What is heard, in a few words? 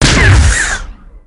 Shot